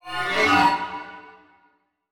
Multimedia Internet CD-Rom Flash Element

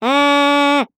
Animal Mammal Carnivore Sea Otter Whine; animals; AdobeCC

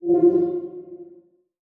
Transition Bell Warble; Production Element Title Transition

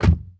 Auto Nissan Altima Door Close Interior; Transportation

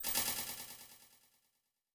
Title Transition Delay Short; Production Element Title Transition

